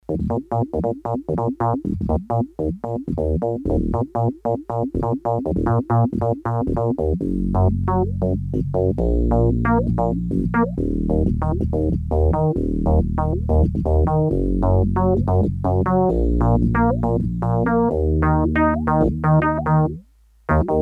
Round Keys, Korg DS-8; Factory Patches

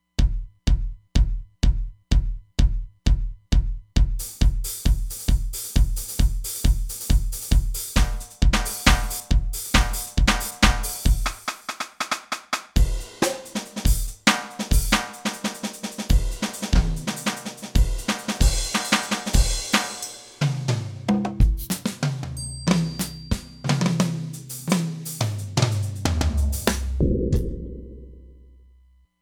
X Euro Kit; Drums

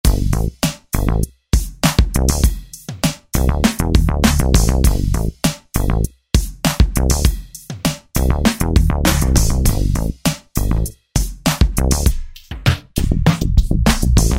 RnB Soul KIT, Roland V-Synth

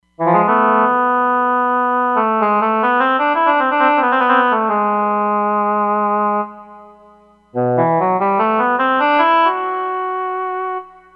Oboe; Roland Fantom XR

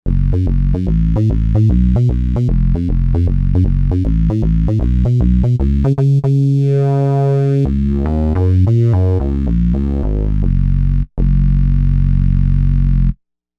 Buzz Bass; Novation UltraNova; Factory Patches

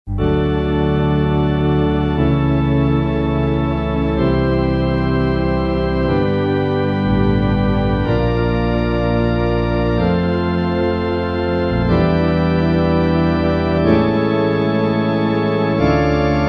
Old Organ; Roland M-DC1

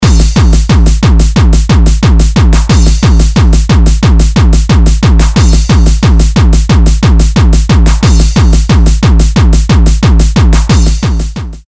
HARDCORE, Hammerhead; Bram Bos

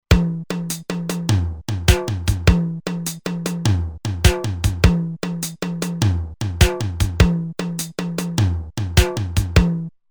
Reggae Fill In, Roland TR-505; Factory Rhythms

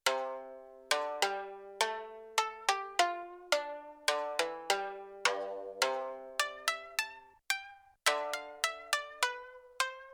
Duo, Shamisen, Yamaha TX16W

